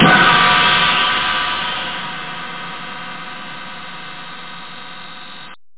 cymbal

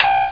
Bridge Ground Beep; Star Trek JR

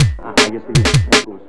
DRUMLOOP D+B 160, Drum+Bass Loop; eJay

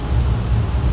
ENG ROOM

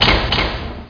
med key; Quake

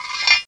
Scrape; SoftKey

